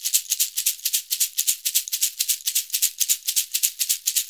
SHK, Shaker; Univers Sons; Basicussions

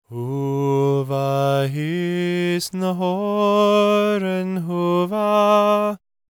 GAELIC, World Voices; ILIO; Vocal Planet

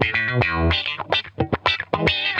Funk Guitar; 90 s Sample CDs; Zero G

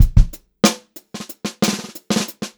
groovy beat, Funk-Groove Drumloops; USB Soundscan vol.38; AKAI

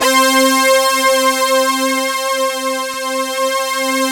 SYN BIG, Analog; Sound & Vision; Gigapack I CD 2

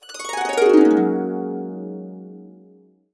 Harps

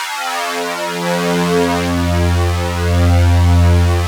SYN JX, SYN Analog; Sound & Vision; Gigapack I CD 2

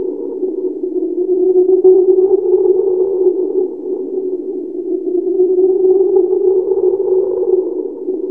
ALIEN WIND; Zero-G Ambient 1

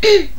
NOISE, CLASSIC VOX; Best Service; Hallelujah

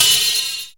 Acidic Kit, Percussion; ohh; Dance Synths

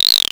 SERGE Guiro, drums; Polyend; Stazma & Concrete Collage; Concrete Collage Drum Kit; Concrete-Serge Drums

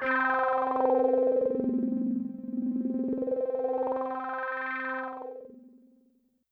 Trance, YAMAHA RM1X

